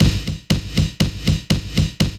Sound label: BEAT KICK